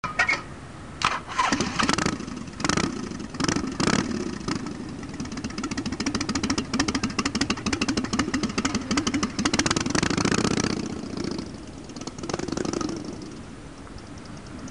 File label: harley sound